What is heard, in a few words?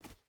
footstep hide stones jump start; Rust